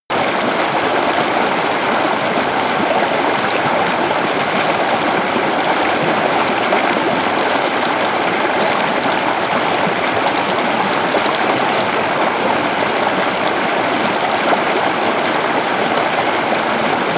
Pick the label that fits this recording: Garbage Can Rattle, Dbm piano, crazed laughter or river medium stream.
river medium stream